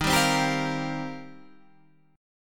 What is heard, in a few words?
Eb 6th Add 9th, Guitar Standard; strummed; 11,10,10,12,11,13